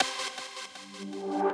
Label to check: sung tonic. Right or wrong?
Wrong